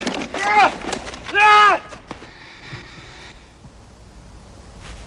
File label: yells